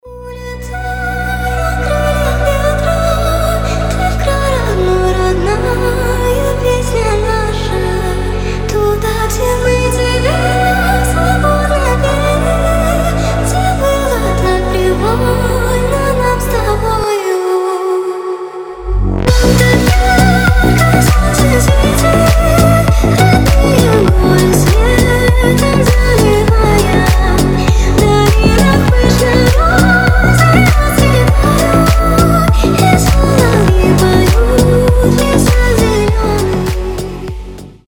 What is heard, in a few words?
organ